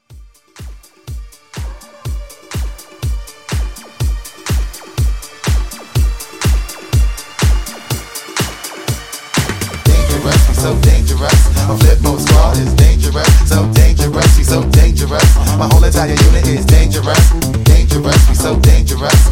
Mixshow 123

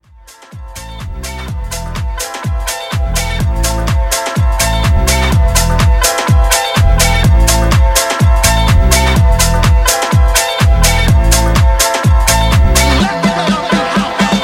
Classical Phonk Segue Mashup; Remixfreakz

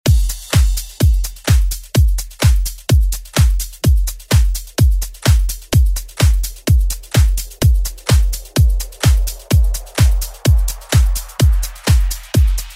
90s; ReDrum

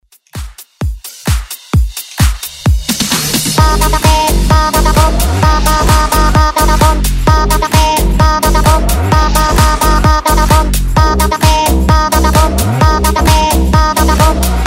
Dance Redrum

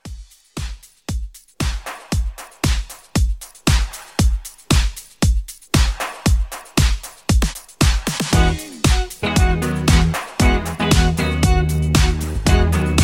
70s Re Drum 116

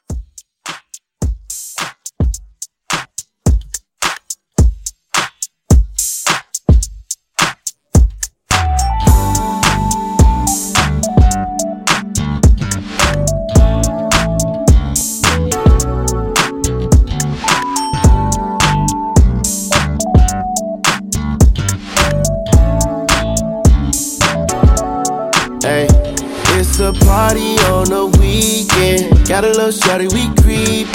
Dj Intro Outro 107 DIRTY

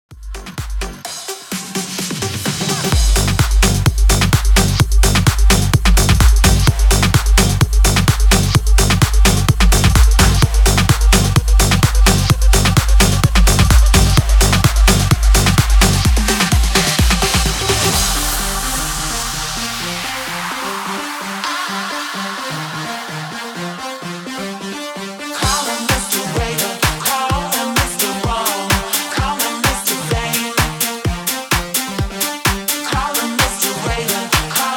BOUNCE 128 BPM

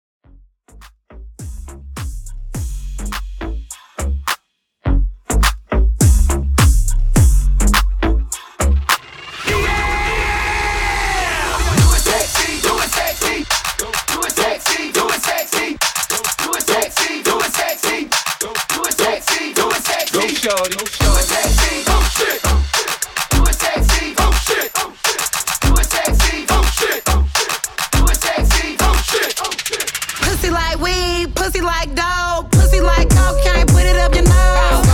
Epic Intro

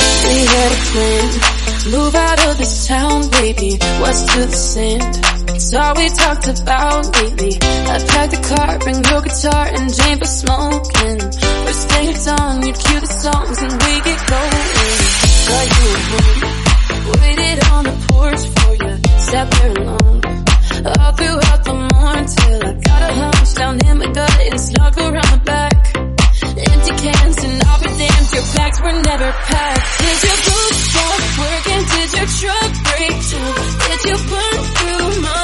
Club, 126 bpm C

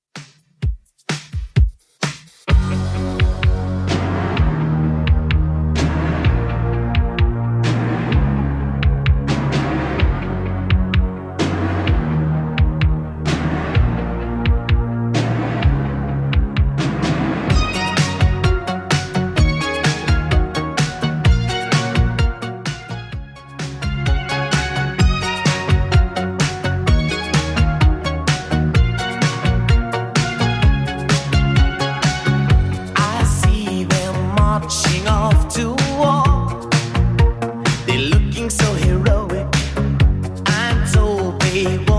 80s 128bpm; Redrum

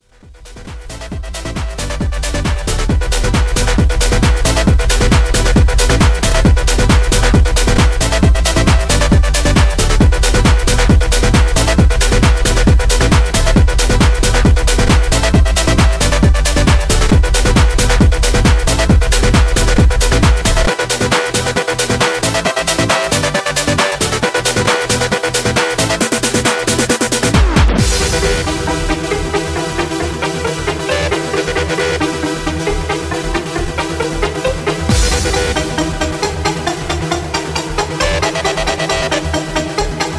Epic Segue Festival 135Bpm

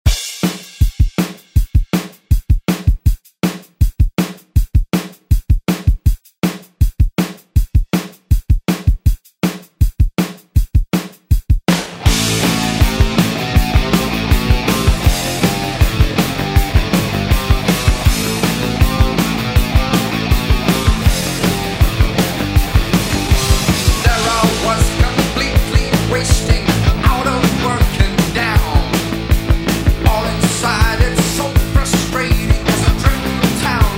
80s Re Drum; DJ Mhark; remixplanet

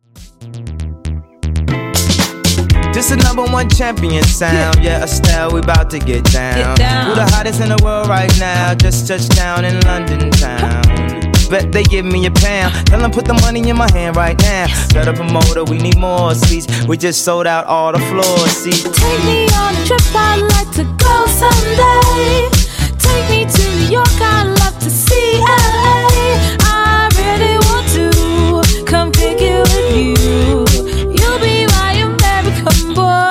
Funk Nudisco Edit 118 Bpm

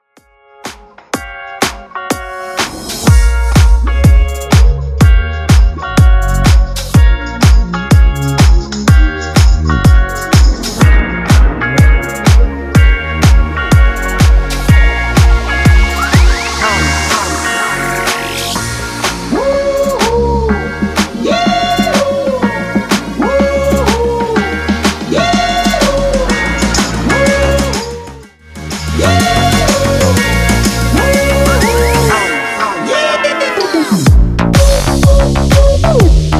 Bootleg House 124 bpm

